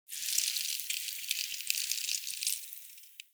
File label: Forest Dry Leave Move; Ambience